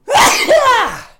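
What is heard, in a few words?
Monster Sneeze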